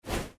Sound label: torch swoosh; effects